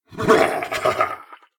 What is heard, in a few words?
laughter; Eshimal; SyrinScape; Snarl and Oraculum